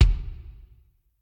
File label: defeat kick; smite; raijin